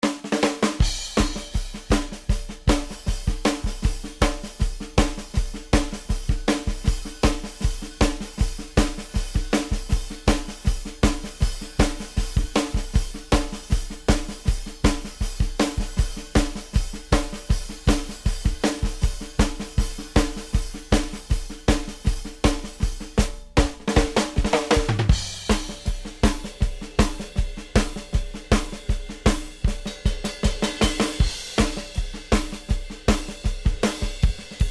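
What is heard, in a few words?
new wave, full mix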